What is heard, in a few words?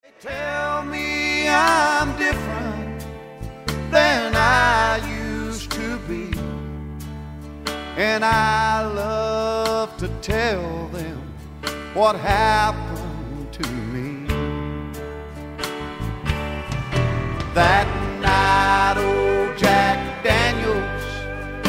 Ballad 3 4 Time Shuffle